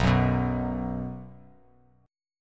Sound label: B 5th B, chord; strummed; 7,9,9,x,x,x,x,x; JGuitar